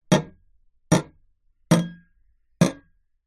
impacts glass knock on glass window single pane x4